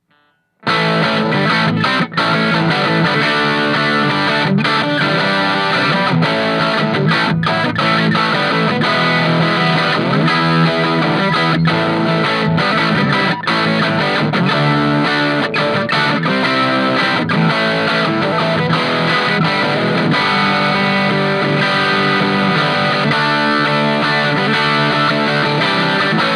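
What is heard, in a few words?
crunch bridge, Crunchy Tones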